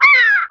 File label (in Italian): Aquila 2, Verso dell'aquila; Suoni animali; Il JukeBox di Lara